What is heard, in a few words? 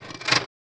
Click Unlock; FREE SFX PACK VOL 2